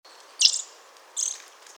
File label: Sitta europaea; SETE